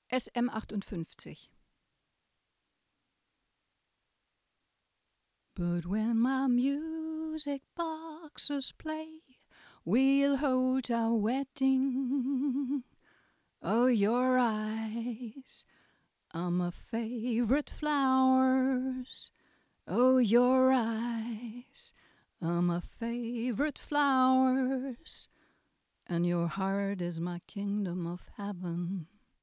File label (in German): shure sm 58 gesang